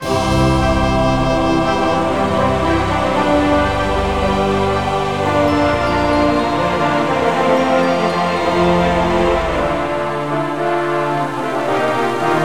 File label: victory; starwar; darkmidi